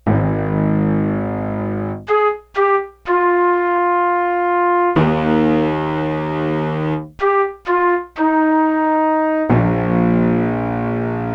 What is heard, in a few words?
TYMPELLO WOODEN FLUTES